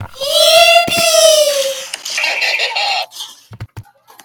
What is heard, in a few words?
yippie